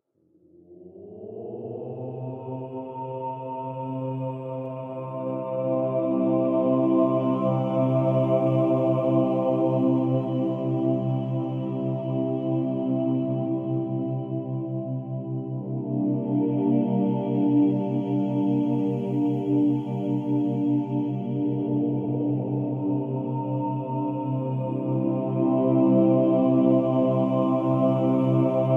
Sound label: om mantra